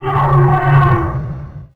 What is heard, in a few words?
roar